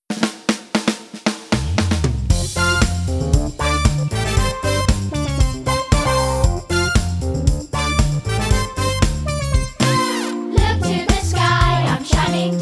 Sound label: Disco Star (Vocal)